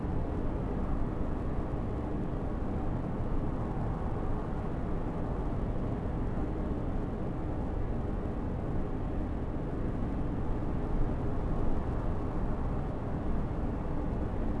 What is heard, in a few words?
outdoor hall, ambience; Star Wars Obi Wan; XBOX; Theed